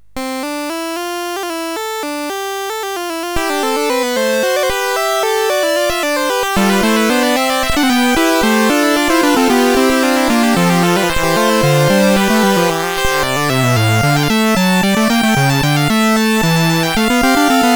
Fugue in C Major, CSG8580R5

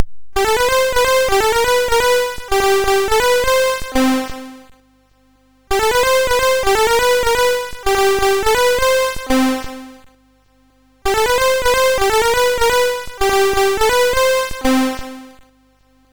MOS6581R3, sid; Omidar

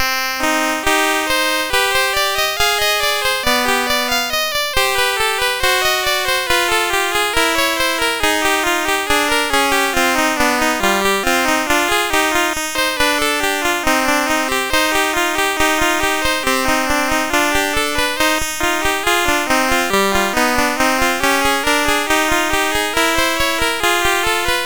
Crab Canon, MOS6581R4

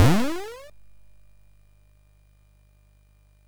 sid MOS6581R3; Toobin

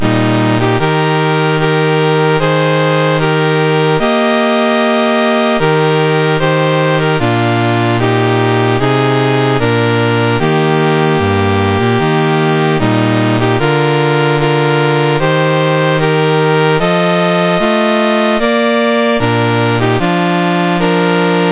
Surinam, National Anthems, MOS 6581; MOS6581R4

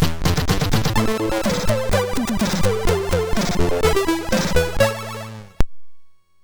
sid MOS6581R2

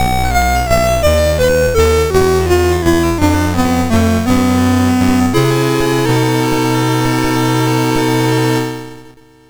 Commodore 64 Music, MOS6581R2

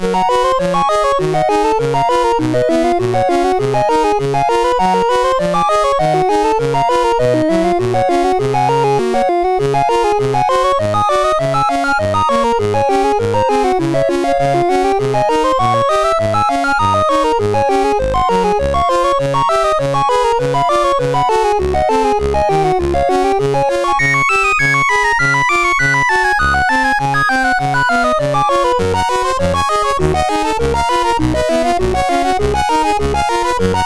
Etude in G Flat, MOS6581R2

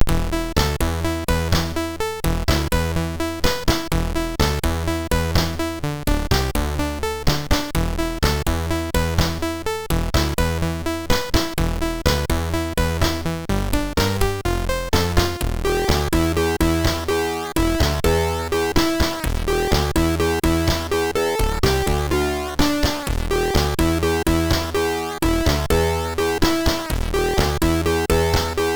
Lights On, MOS6581R3

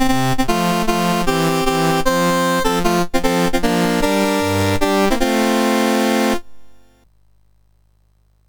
sid MOS6581R3; Games Summer Edition menus

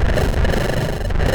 Die Alien Slime, MOS6581R2

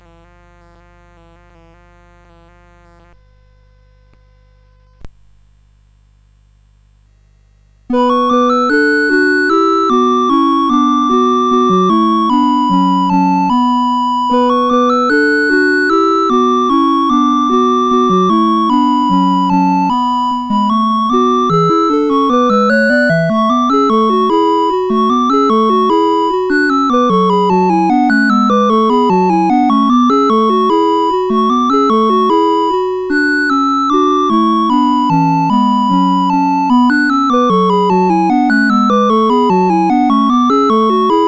CSG8580; CSG8580R5

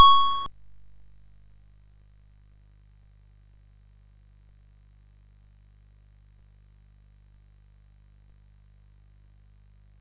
sid MOS6581R2

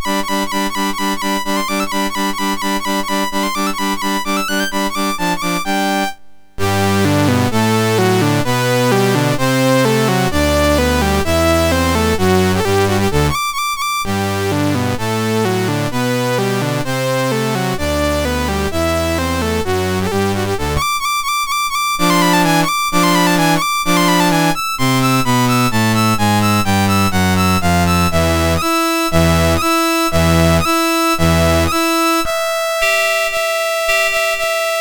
Anvil Chorus, MOS6581R2; from il Trovatore

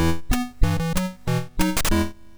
MOS6581R3, sid; Night Knight

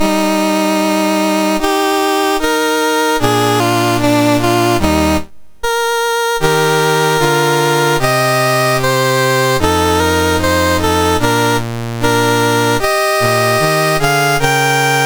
MOS6581R4, National Anthems; Selangor

